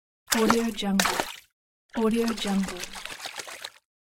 fish jumping

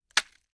knife slicing flesh stabbing many rapid chops

